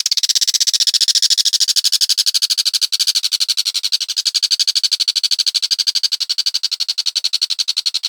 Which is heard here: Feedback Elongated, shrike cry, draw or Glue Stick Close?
shrike cry